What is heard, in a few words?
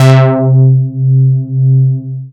Synths Note C